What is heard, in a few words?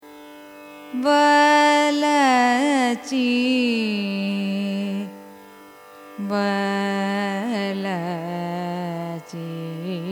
raga valaji signature, carnatic music